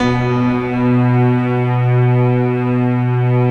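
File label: piano strings, B2; Best Service; Advanced Media Trax Modern Composer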